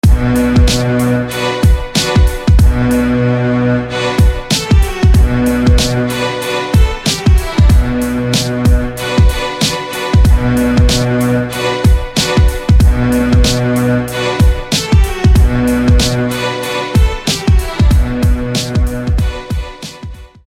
Surround Strings; SFB FX

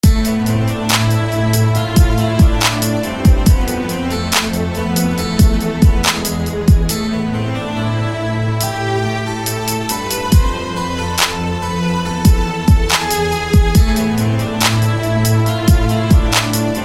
Mood Strings; SFB FX

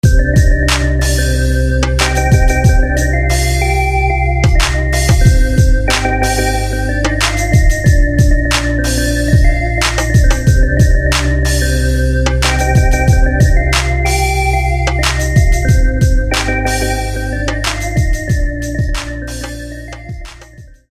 Mystik Organ; SFB FX

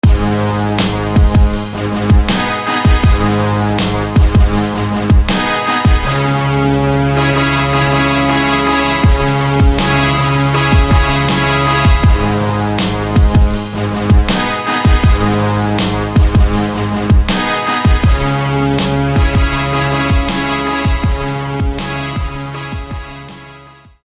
Band Brass; SFB FX

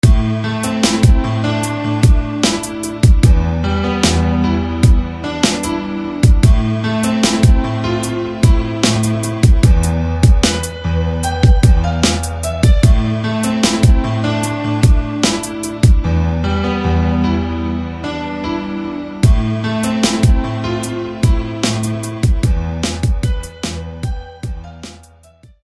Beautiful Grand Piano; SFB FX

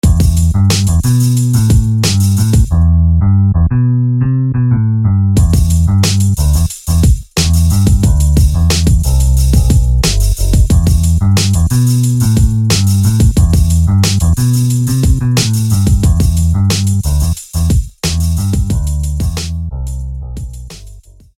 Bass; Realist; SFB FX

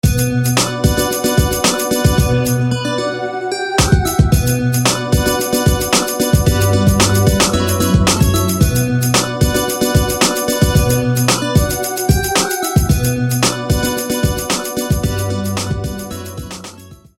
Glass Spherical

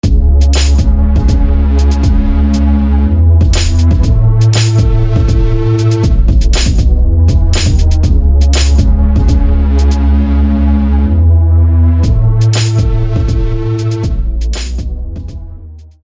Guardian Bass; SFB FX

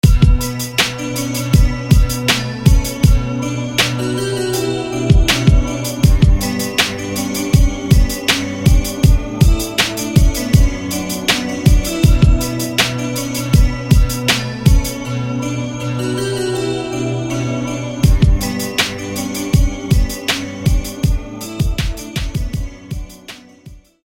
Echo Phone

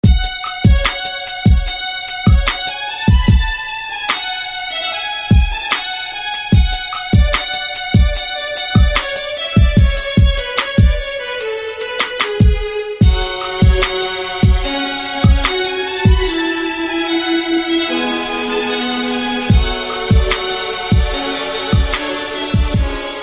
Blue Violin

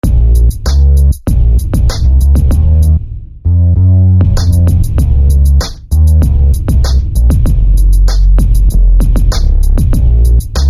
Jackal Bass; SFB FX

